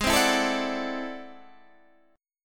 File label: A Minor Major 13th Ab